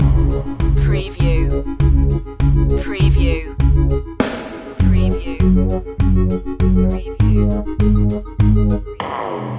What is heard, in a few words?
urban beat loop